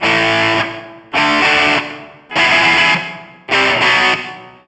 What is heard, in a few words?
rythm